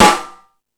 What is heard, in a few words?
Sluggo Drums, Drum Machines